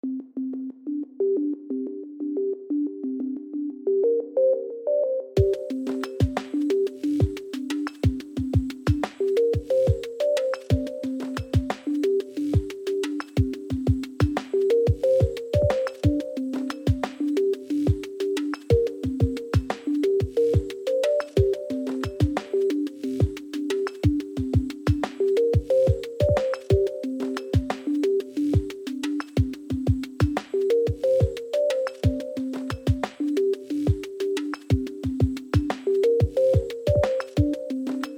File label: Positive search reduced